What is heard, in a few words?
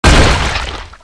hammer hit slash